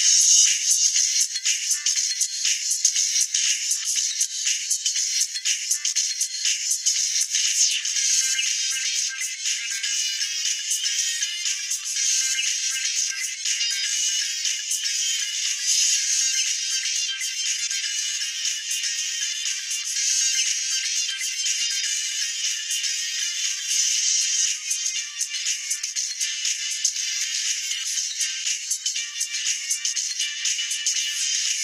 Ringtone; Nokia 2.2

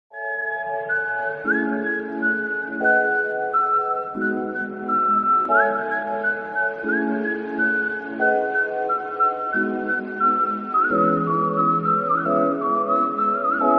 Relaxing Whistle; Bgm

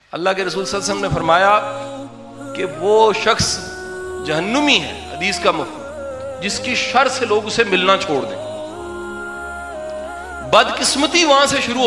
Islamic

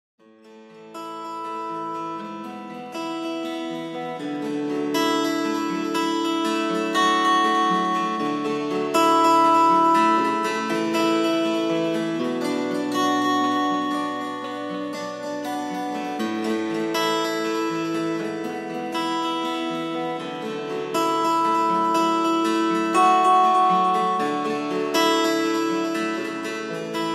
Huawei P60 Pro, ringtone

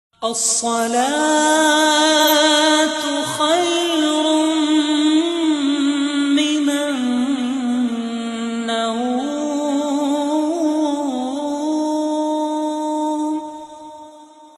Assalatu khairum minan naum fajar ringtone

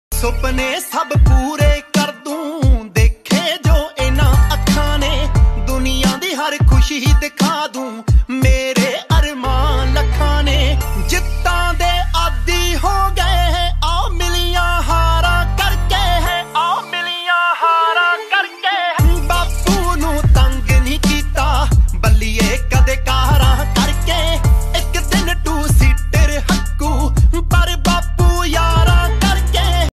Punjabi Ringtone

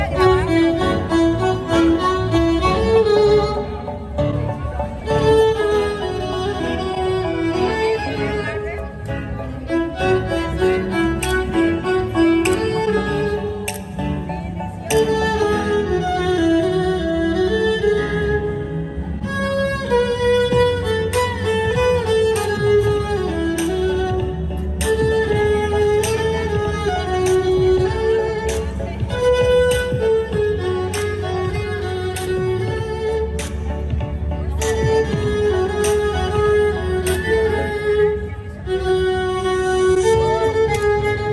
Violin

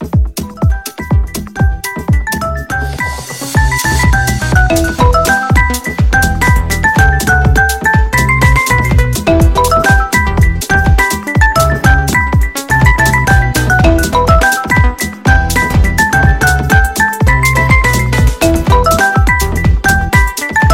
Vivo S10E, ringtone

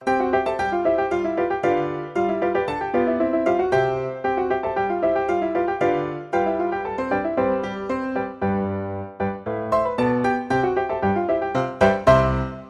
Huawei; Barroom

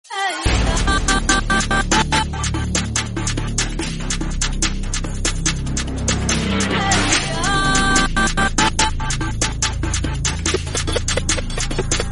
Bgm Drill

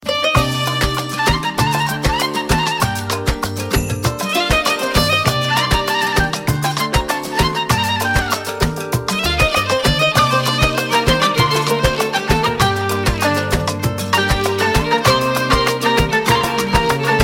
Awesome Arabic

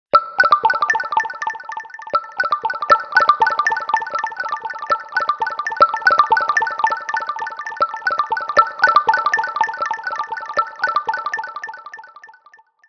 Huawei P60 Art, ringtone

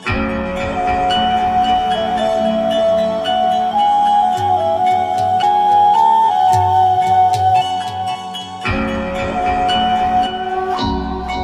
Vivo Best Tune, ringtone

